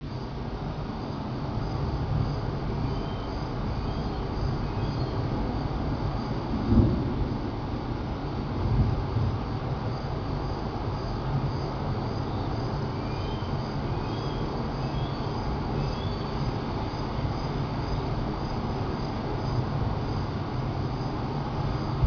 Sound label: ambi; cstrike